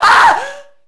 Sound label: female get hit; enemy; Max Payne